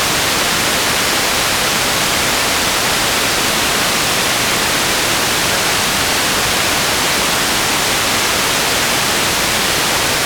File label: pink noise; MFOS; syntherjack